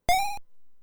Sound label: Tandy Yippee!; Lemmings